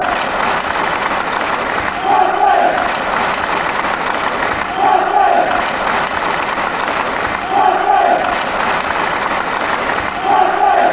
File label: clap; johnstone